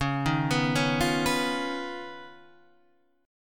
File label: Eb 7th Suspended 2nd Sharp 5th, Db